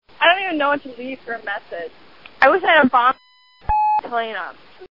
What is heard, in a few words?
bomb plane